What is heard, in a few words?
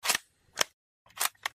reload gun